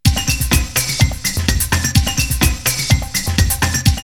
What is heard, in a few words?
126, drumloops, Techno&House; X-Static Goldmine; 90 s Sample CDs